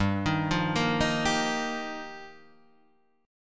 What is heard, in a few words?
G Minor 7th Sharp 5th, Guitar, Drop D